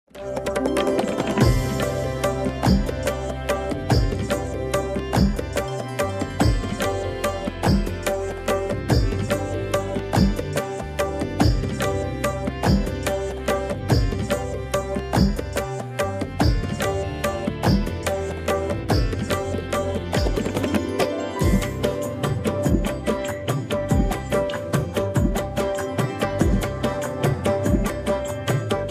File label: instrumental ringtone ost ringtone tabla version; danish taimoor hiba bukhari